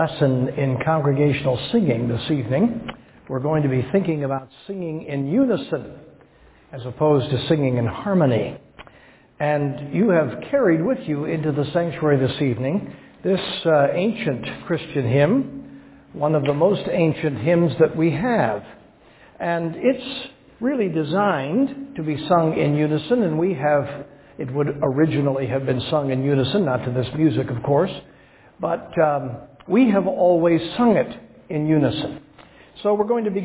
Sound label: Cong Singing Unison